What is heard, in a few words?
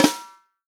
REAL DRUMS, POP KIT; Best Service ProSamples vol.43 - Real Drum Kits